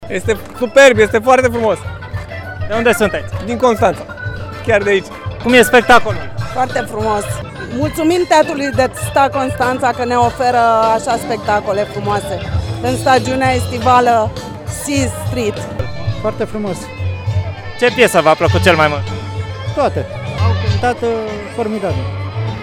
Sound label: VOX ORCHESTRA; teatru stradal; SEAS 2024